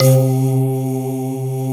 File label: SYN K-2000, Roland; Sound & Vision - Gigapack I CD 2